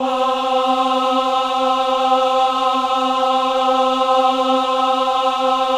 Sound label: VIB C4, VIBRATO, Voices; Spectrasonics